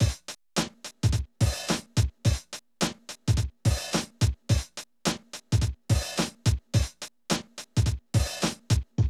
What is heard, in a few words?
Drum loops, Hip-hop